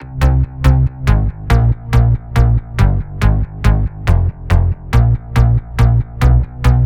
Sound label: 140BPM C; Vengeance - Essential Clubsounds Vol.4